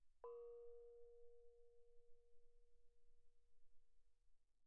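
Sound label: thai gong Bb4 pianissimo, Percussion gongs & tamtams; thaigongs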